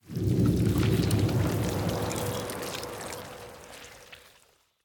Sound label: Onsen Water Change Dirty; The Inn